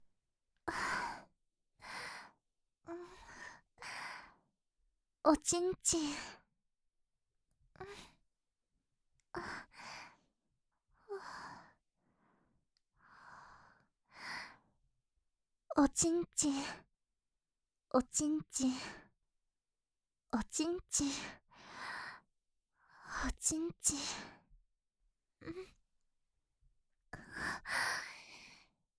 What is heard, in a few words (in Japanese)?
しっとり喘ぎパート; オナサポ